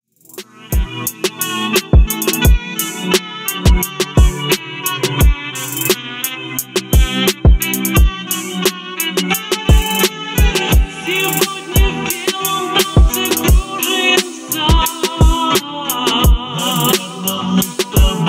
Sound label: phonk remix